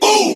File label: "ooh" male; ooh ahh